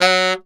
Tenor Sax Portato F#2; DirectWave; Varazdin Orchestral

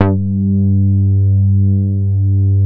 VCO bass G3; SE-1 BASSES

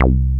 TB BASS; TB-303

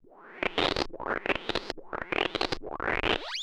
SPACE FX 140; SpaceSounds

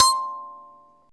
KALIMBA D4; ETHNICSTUFF

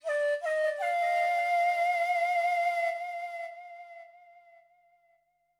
flute, ethno, 160, C

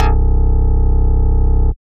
BASS STER.

